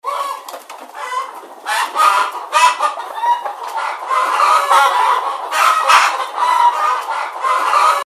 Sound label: Alarm call